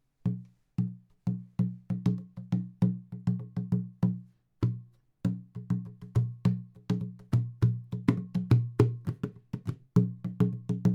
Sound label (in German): klangbeispiel kanalrohrtrommel